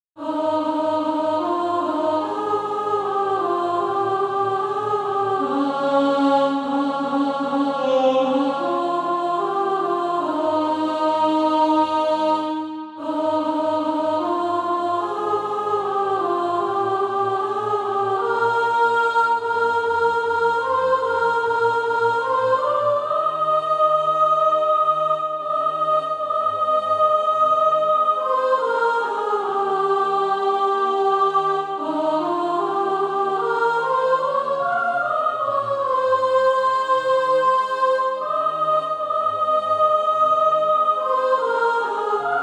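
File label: soprano, Practise Music; SATB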